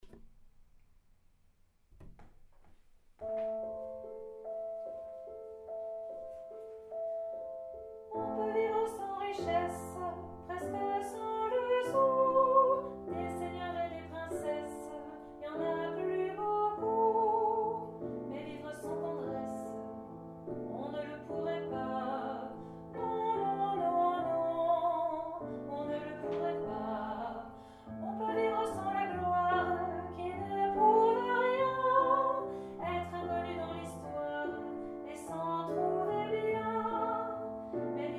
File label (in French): Basse, Choeur